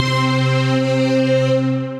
Orchestral Hit

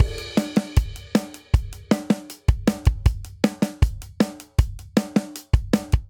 Twist 02 Verse 157 bpm, drum; Ableton; Factory Packs; Drum Booth

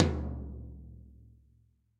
Tom Floor Steely Tight; Drums; Drum Hits; Ableton; Factory Packs; Session Drums Studio; MPE Hits

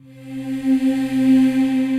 Vox Synthetix, Disembodied Voices; Instruments; Ableton; Mood Reel

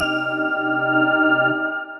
Eerie Organ, Synth Lead; Ableton; Skitter and Step

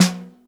SN1 Bottom, snare

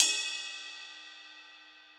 Ride Bell Classic Muffle; Drums; Drum Hits; Standard Hits; Ableton; Session Drums Studio

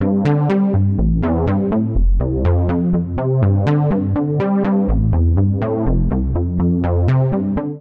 C Major 123 bpm; Accompaniment; Tonal; MIDI Clips; Flight Time; Drive and Glow; Ableton; Factory Packs

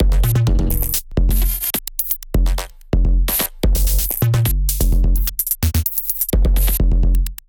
Beta Fish Kit groove 128 bpm; MIDI Clips; 122 - 130 bpm; Ableton; Factory Packs; Glitch and Wash

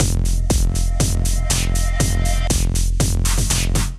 Mafia District Kit, Drums; Ableton; Build and Drop

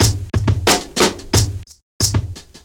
Kit-Jagged Glas, Drums; Ableton; Breakbeats by KutMasta Kurt

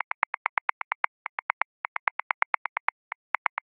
Hip Hop Funky; Grooves; Ableton; Factory Packs

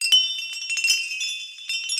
Quartz Chimes, Playful Percussion; Ableton; Mood Reel

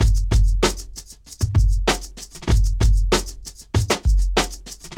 Jagged Glass Drums; Ableton; Breakbeats by KutMasta Kurt

